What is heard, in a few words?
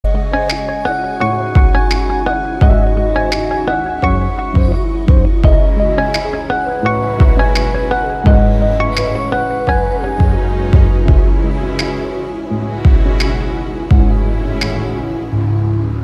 Love Bgm